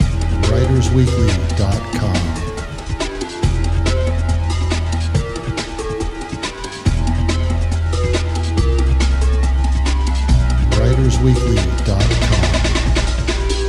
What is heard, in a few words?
Rock